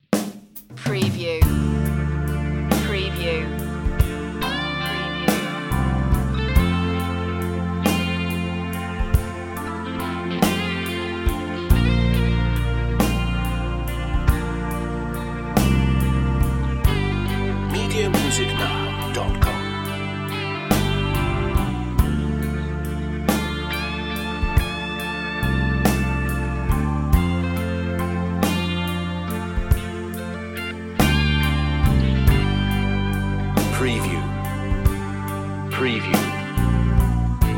blues

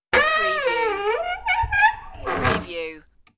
Creaky Door

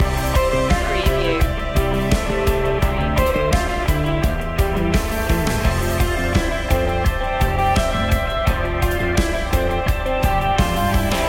pressured loop

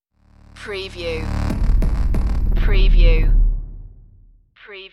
binary clash stinger

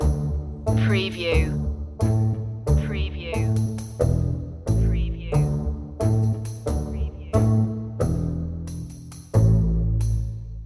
lightly macabre loop

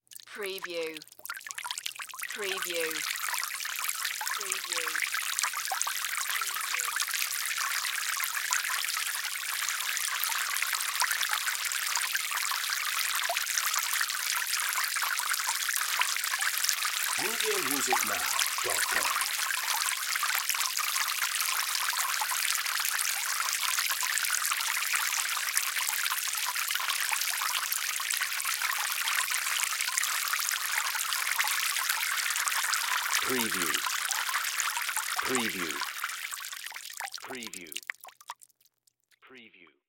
Water Pour Into Pool; sound effects bible

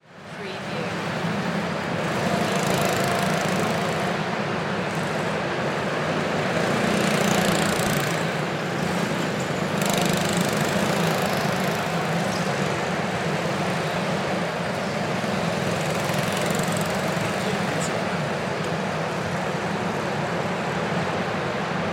Go Kart Track Race; sound-effects-bible

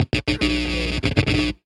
Electric Short Stutter

